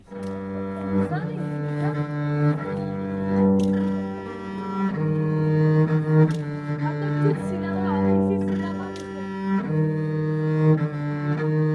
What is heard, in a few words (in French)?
Interlude viole de gambe